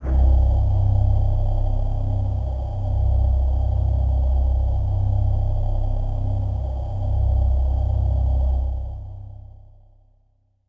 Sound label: Voices Kawaii C0